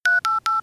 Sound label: dial; kpd call button pressed